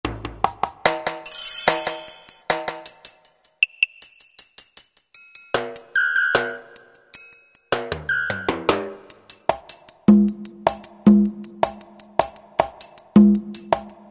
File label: LATIN, Roland SN-U110-02 Latin And F.X. Percussions